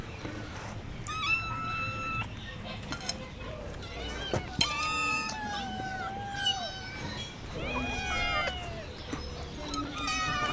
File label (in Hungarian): nyávog dorombol eszik, macska; állathangok; paraszt udvar